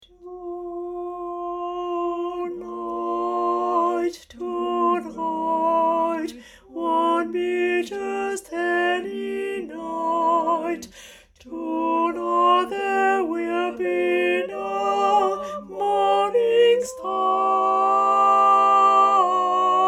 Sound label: tenor; version chantée